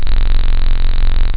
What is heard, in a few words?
tronic, synth bass